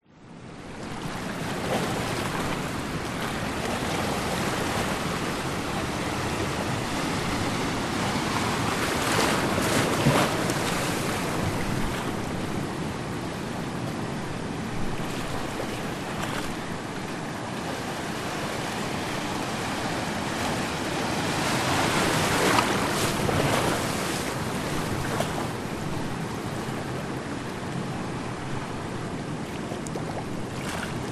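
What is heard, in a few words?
nature ocean waves medium splash into rocks distant surf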